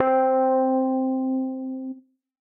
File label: Piano & Keys; Rcrd Plnt; Ableton; Factory Packs; Chop and Swing